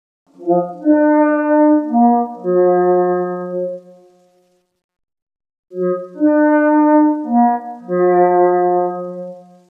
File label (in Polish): zawolanie